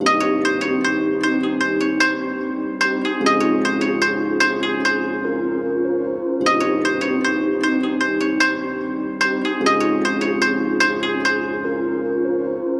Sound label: 150 melodic loop Fmin; goombah